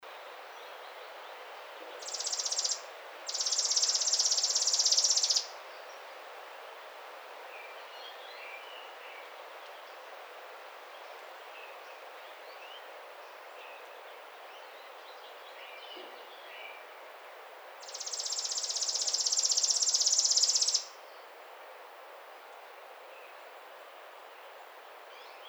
Chipping Sparrow

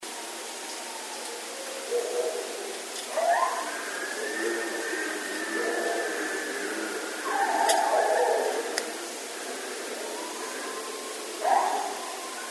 Coyotes; Coyotes (Canis latrans)

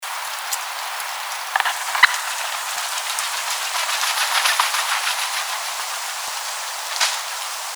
Wild Turkey

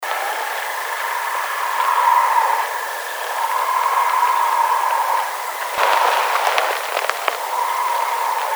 Hissing, bigfoot

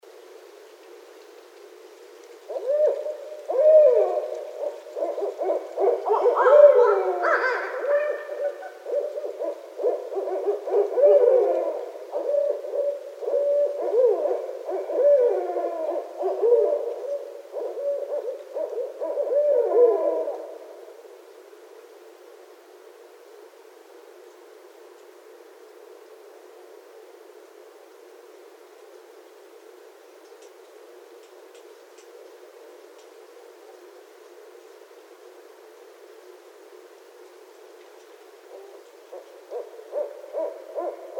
Barred Owl

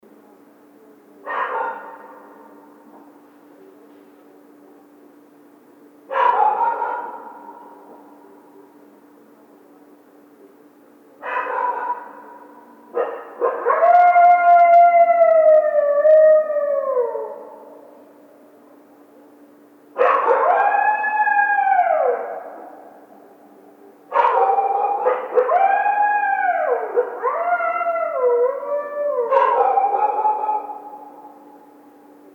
howl, Coyote; Coyotes (Canis latrans)

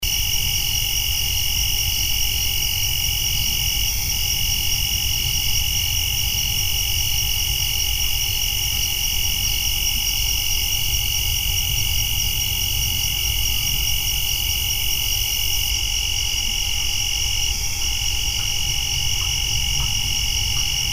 Rock Clacking; bigfoot

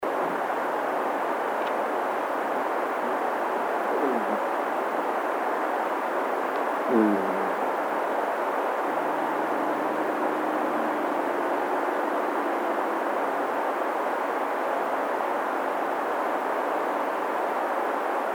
Whos Knocking Door

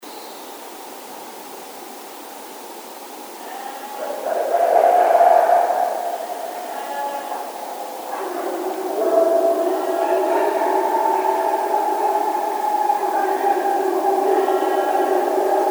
voice, bigfoot

